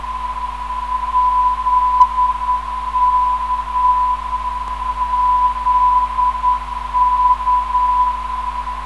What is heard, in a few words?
ZK 346kHz, ndb